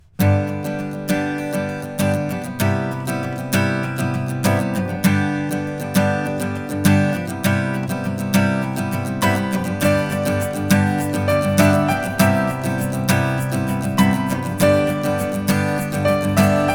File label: Acoustic